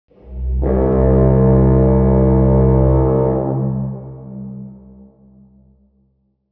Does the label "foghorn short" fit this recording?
Yes